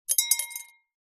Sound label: small plastic object drop into glass